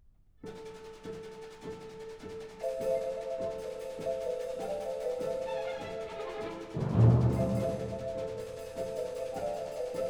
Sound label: Carmen, ballet for strings & percussion, First Intermezzo; Orchestre Philharmonique de Monte-Carlo; after Bizet; Rodion Shchedrin